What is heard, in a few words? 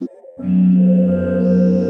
Gentle Ambience; Ableton; Factory Packs; Inspired by Nature by Dillon Bastan; Emit Presets